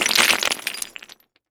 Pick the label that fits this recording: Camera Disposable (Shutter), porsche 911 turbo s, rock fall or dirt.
rock fall